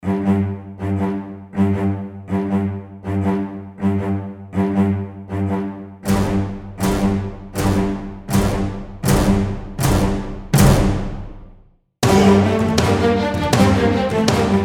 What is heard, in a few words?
Intense Cinematic Intro; ВидеоМОНТАЖ 23.0; ams music library